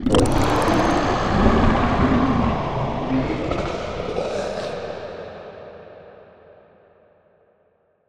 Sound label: Distant Absctract Scream, Monster Sounds; TheDeclineOfWarriors; Monster Sounds Volume II